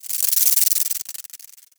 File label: rattle death layer